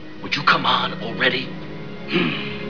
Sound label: growls